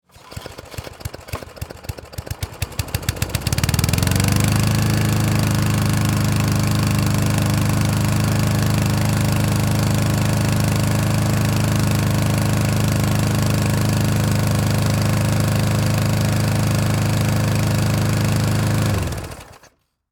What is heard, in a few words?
Industry Lawn Mower Pull Start Run Off; Lawn Mowers; Machines; SFX Bible